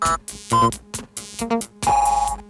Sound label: synth